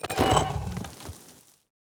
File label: swing strain stone